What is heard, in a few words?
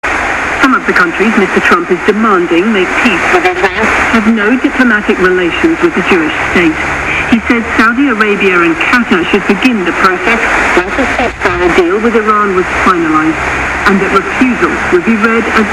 Chinese radio interference over BBC 17780 kHz; CGTN versus BBC